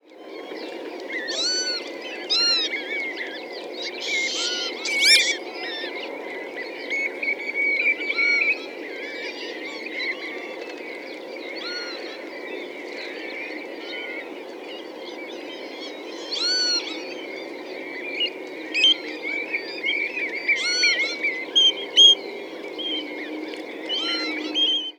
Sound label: lapwing call